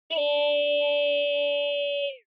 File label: SNES Soccer Goal